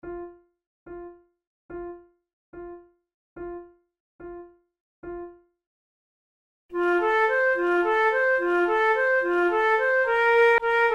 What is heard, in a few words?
an old man would be wooing duet, flute